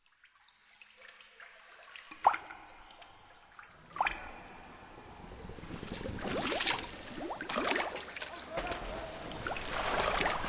Clapotis

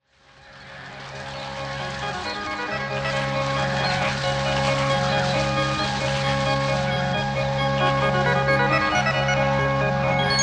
Orgue

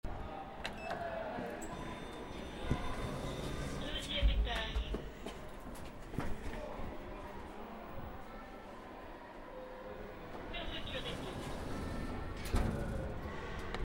BRUIT ASCENSEUR; collège H. de Balzac

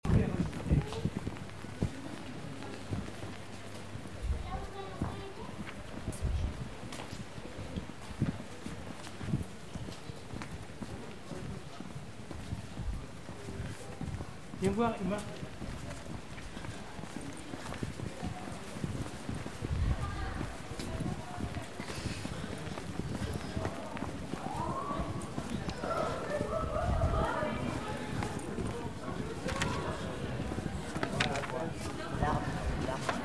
BRUIT MARCHE VOIX COLLEGIENS; college renee taillefer; ambiances diverses

